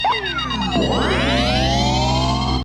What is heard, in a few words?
charge; claus